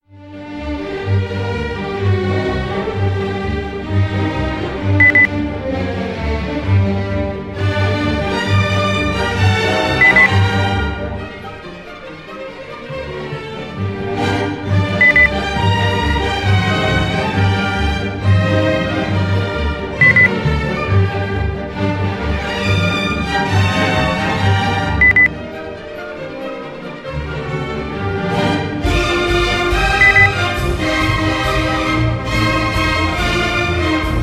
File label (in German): klassik